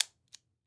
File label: Weapon Gun Pistol 22 Caliber Trigger Pull; AdobeCC